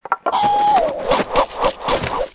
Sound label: Basic Spinjitsu, creatures organic; Skullken; aronwk collection; ndaudio